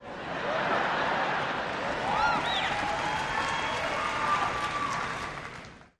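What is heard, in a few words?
Crowd 50000 Laughs, large interior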